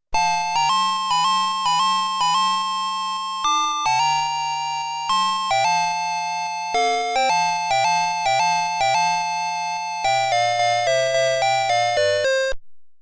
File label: Menuet